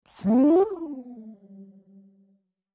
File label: Dash